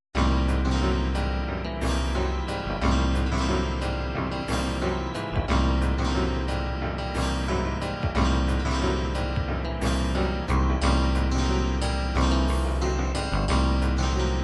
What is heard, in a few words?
syncopat, midi